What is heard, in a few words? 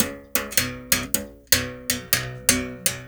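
BS BERIM GRV, BERIMBAU; Spectrasonics; Heart of Africa 2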